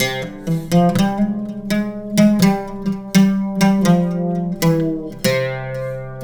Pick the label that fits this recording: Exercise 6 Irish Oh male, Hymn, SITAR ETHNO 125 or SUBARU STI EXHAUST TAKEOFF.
SITAR ETHNO 125